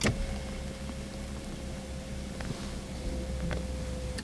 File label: seat; transpo